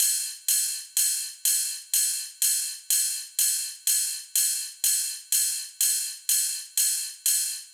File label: percussion ride cymbal techno